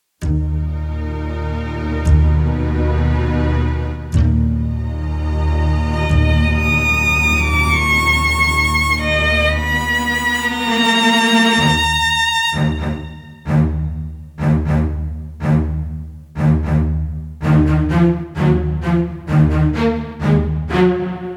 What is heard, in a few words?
STRINGS